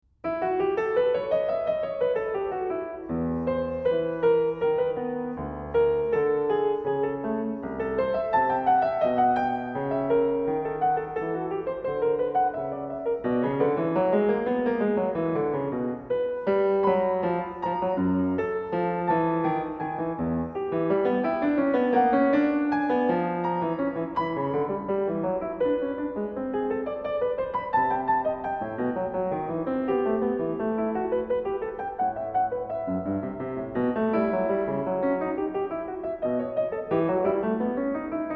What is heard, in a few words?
Duet in E minor, BWV802; Bach - The Art of Fugue; Bach, J S